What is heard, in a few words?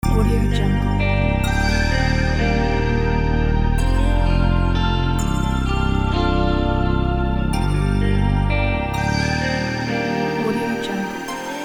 guitar hiphop